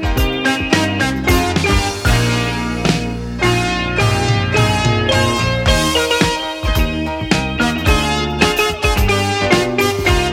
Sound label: punky loop